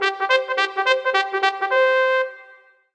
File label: bugle advance